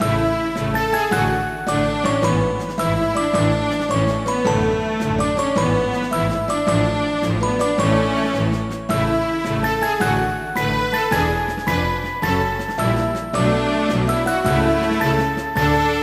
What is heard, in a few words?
march